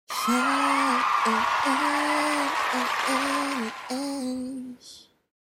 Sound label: cheer w shish